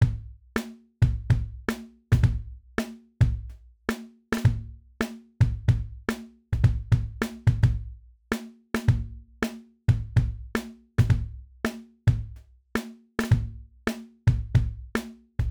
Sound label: Upbeat Kick & Snare